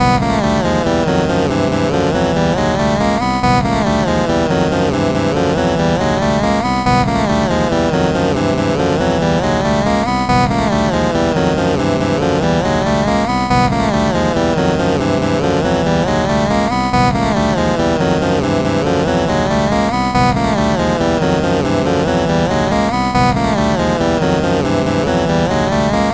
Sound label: updownsongsave